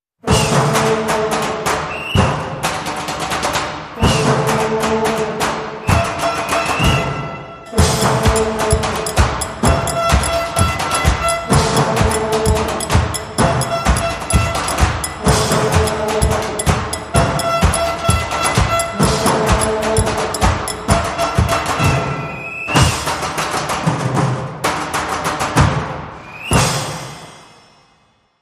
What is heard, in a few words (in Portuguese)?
Caixas De Percussao